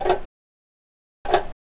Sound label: ticking; tfc